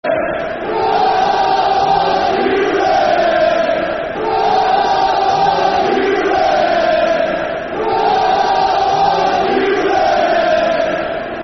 Football Chants (Soccer Songs); Come On You Reds; Barnsley Bfc

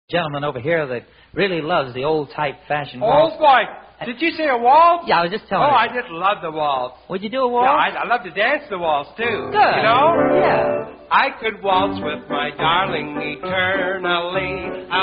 Fast Waltz; 1 2 Fast Waltz [Aka Half-Ass Waltz]; billy devro

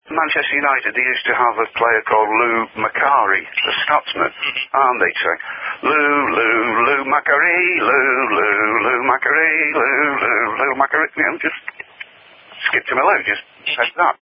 Football Chant; Lou Mackary

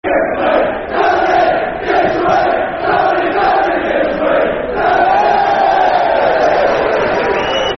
Football Chant, Soccer Songs; Downing Give Us A Wave; Cheltenham Town Ctfc

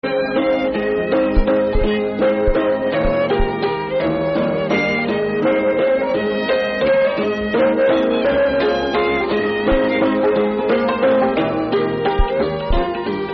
Waltz; Battles Waltz

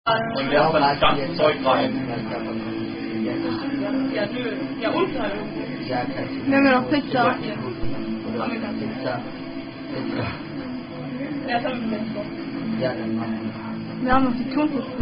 German Drinking Game; Sommerferien 2007; German Drinking Game With Five Glasses And A Spinner In The Middle

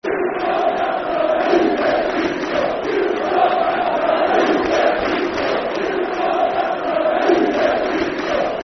Bradford Army, Football Chant (Soccer Song); Stuart Mccalls Bradford Army; Bradford City Bcfc

